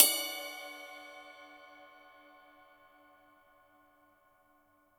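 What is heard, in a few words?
CYMBAL, real drum kit; HIPHOP KIT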